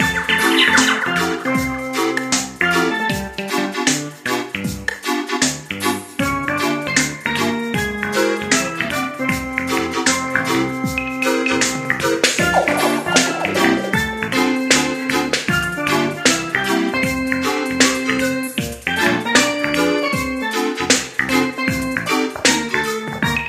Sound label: Arcade music; Postal 2 Eternal Damnation